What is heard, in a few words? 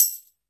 TAMBOURIN; AKAI S-Series CD-ROM Sound Library VOL-5